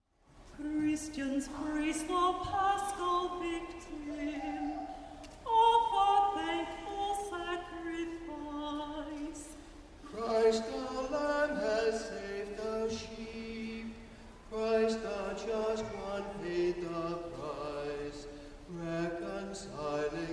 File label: Easter Sequence; Easter Sunday; St Mark Sunday Mass